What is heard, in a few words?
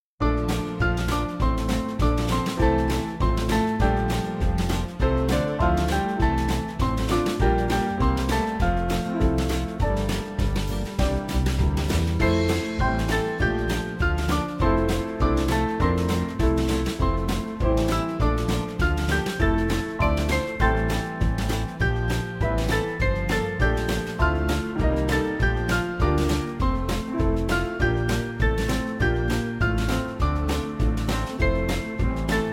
Band; In The Fields; Yenching